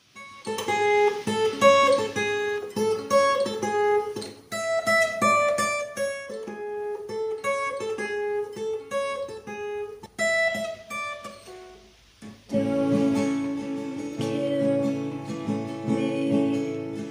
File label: Cover guitar, guitare